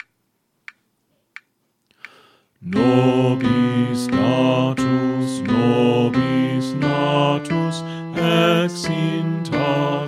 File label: Bass; Pange, Lingua, Gloriosi; St. Columba Choir Practice Tracks